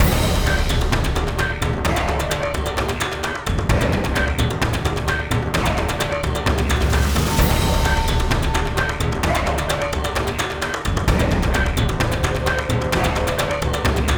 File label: Combat; Skellige - Ice Giand Island